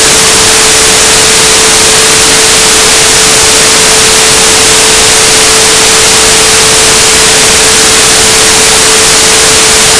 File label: poor s n